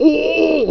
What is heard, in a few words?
pain; HALFE LIFE 3